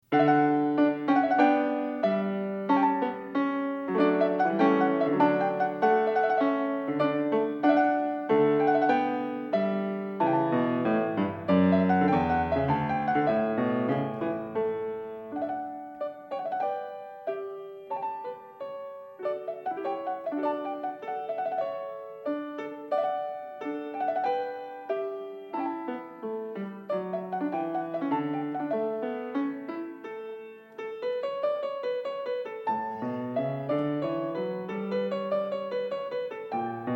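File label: Menuet, piano; Partita 4 in D; BWV828; BACH; PARTITAS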